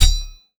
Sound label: target hit; Epic Toon FX; MiniGame-PushPush